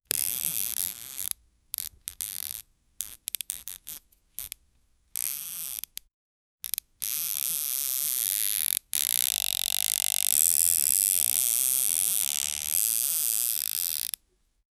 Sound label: rope tied creak; man made